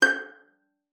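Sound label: violin pizzicato G5; Xsample VOL-5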